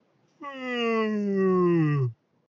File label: yawn; Slimeoos Odyssey; godot